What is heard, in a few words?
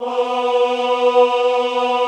AHH B2, Classical Choir; Best Service; Extended Classical Choir